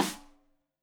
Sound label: Rim Shot Stereo; Deeply Sampled Drums; SMDrum